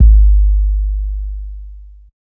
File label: Kick B; Hip Hop; 102 Ebmin